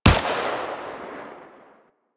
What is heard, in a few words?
Shot; Warframe; Eidolon; EidolonShotgunLancer; Karak